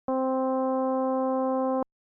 FM Synthesis

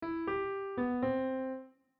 melody syncopated

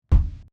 Kick Without Delay

